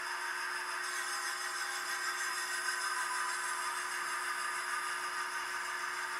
kinzoku

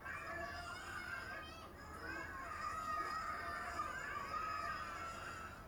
園児エアトースあり

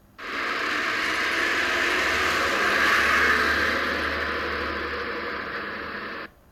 車交通エアトースなし

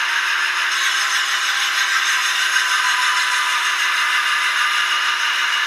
kinzoku none

